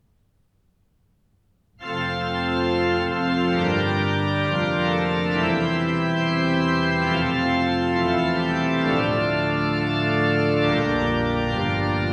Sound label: Fanfare, orgelet, Hamar domkirke